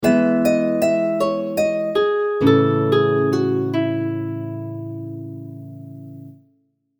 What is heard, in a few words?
Harp